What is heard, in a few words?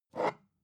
glass bottle pick up